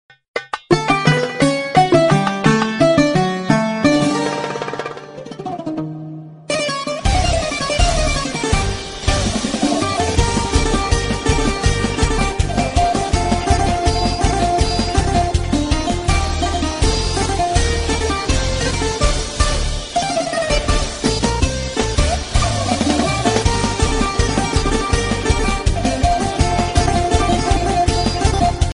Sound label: nokia remix ringtone